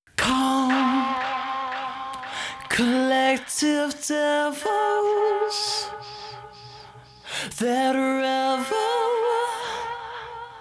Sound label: Vox Delay